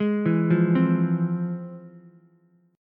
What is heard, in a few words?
F Minor Major 7th, Ukulele, chord; FmM7 arpeggiated; JGuitar